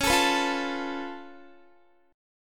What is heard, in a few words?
Db Augmented 7th Db down Guitar